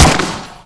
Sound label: weapon fire sten; weapons; Spearhead Demo; MacFormat 2003 June